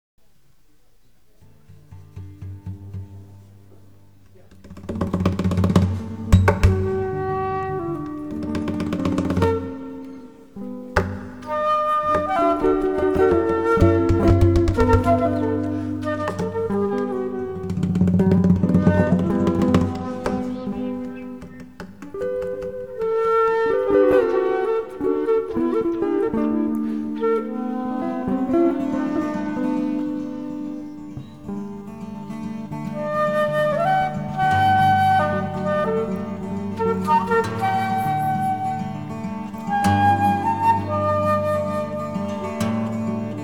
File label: improvisation